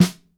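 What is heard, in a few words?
dry snare drum, acoustic drum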